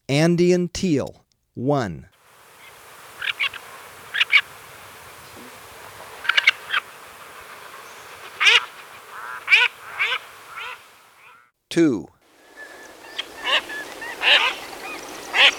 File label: Andean Teal; S. America